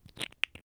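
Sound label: close and put away; item zvezda; Escape from Tarkov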